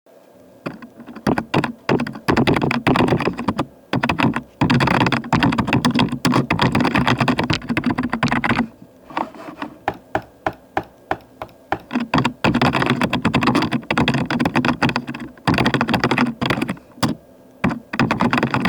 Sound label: Computer Noises